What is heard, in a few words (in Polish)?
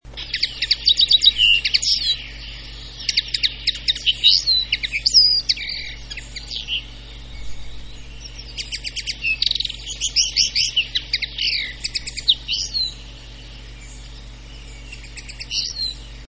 makolągwa śpiewa na tle innych ptaków